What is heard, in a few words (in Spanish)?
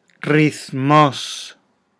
rythmos